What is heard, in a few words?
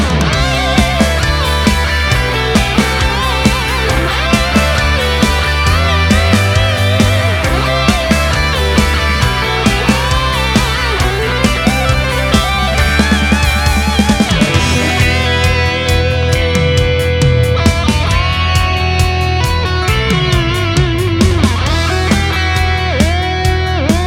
Silent Strings